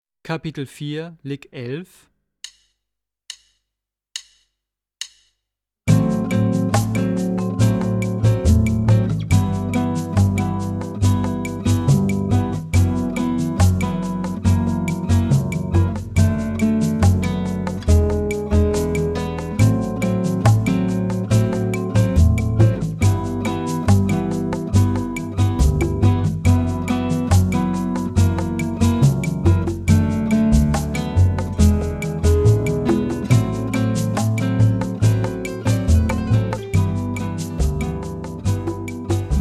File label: Latin Style Guitar